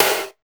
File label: HARSH SNR; Drums In Your FACE!